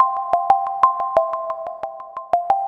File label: Merimbo